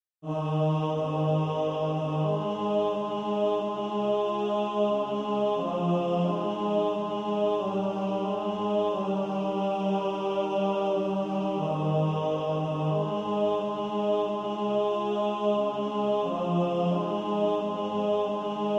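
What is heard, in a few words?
tenor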